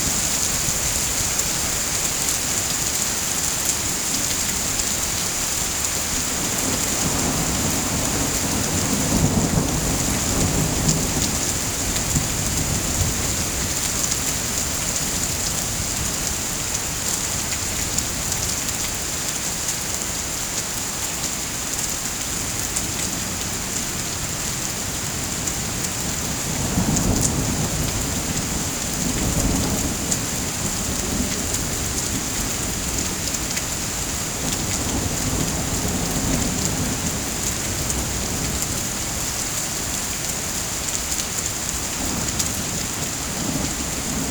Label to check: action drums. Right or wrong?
Wrong